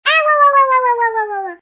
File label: Falling; yoshi; sm64coopdx; char-select-extra-chars; [CS] Extra Characters